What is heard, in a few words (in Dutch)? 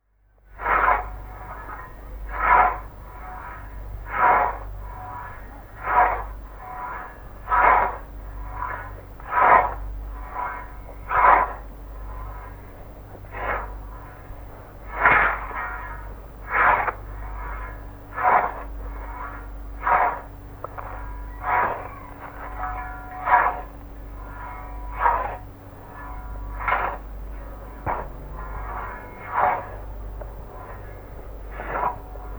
grasmaaien met zeis